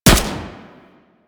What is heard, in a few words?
CY Xbow 2D Shot B; Tenno